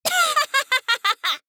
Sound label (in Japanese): 「がははは！」, 女の子, オラオラ系少女